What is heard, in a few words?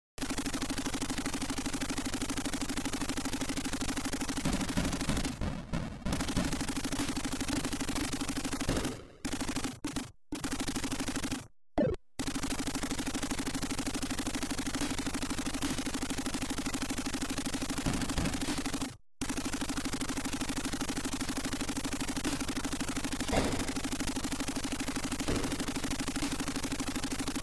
Gun Sounds